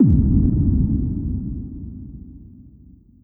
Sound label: deep kick; osu